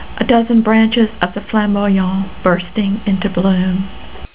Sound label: sounds flam